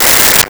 Walkie Talkie Static; Communications

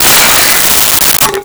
Junk Crash; Crashes; 1001 Sound Effects

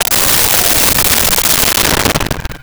Space Ship Fly By, Science Fiction

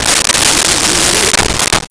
LoFi Gurbles, Comedy Vocals; Vintage Cartoon; SONY PICTURES SOUND EFFECTS SERIES

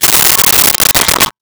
Soda Can Rolling; Crashes

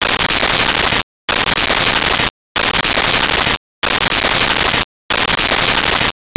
Scanner, Science Fiction

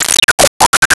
Arcade Chirp; Arcade and Games

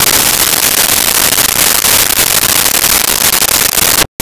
Power Window Down; Automobiles

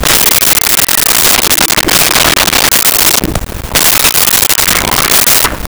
LoFi Synthetic Squawk; Vintage Cartoon; Comedy Vocals; SONY PICTURES SOUND EFFECTS SERIES

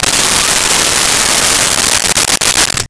Electric Drill Tighten; Tools; 1001 Sound Effects

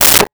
Rotary Telephone Receiver Put Down; Communications

